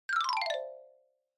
Xylophone Fast Long 1 Down

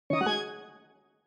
Scene Change Short Up

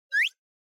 Anime Motion High

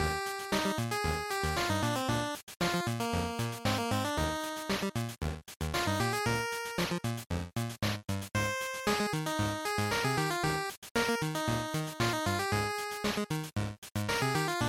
Action, Stage, Loop

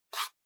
Trackpad Swipe

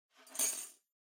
Tambourine Noise

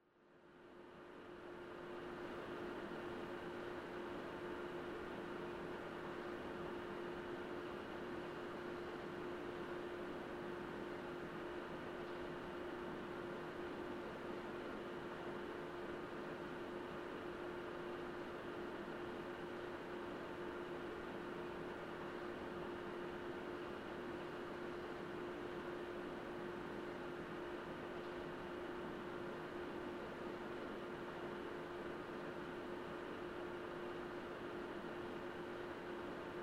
PC Operating Noise Far Long

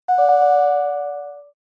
Doorbell Fake; ドアベル フェイク

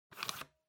Optical Disk Drive Eject

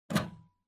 Wall Outlet Wall Unplug

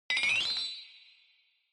Flexatone Short

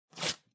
Cardboard Box Label Peel Off; 梱包材; ダンボール; ラベル 剥がす

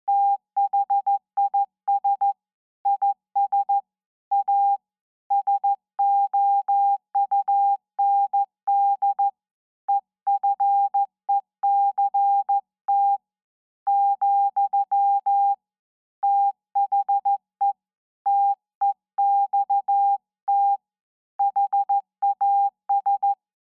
Morse Code Slow

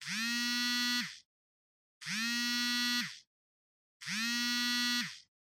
Cell Phone Vibration (Hand)

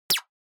Percussive Accent Timbre

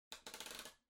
Electric Fan Far Height

